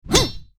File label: daemon attack; propack